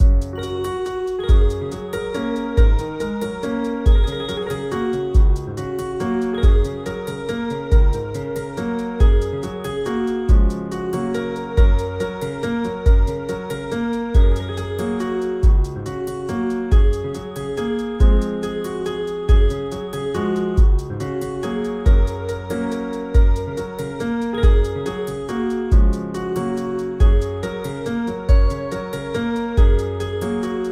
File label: keyboard